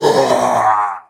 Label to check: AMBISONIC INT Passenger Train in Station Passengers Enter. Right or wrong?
Wrong